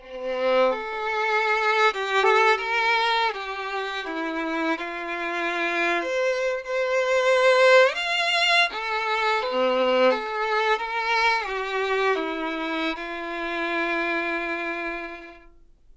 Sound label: violin samples Tenderness